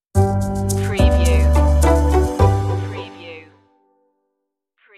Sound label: soft logo